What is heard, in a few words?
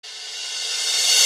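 suspense sound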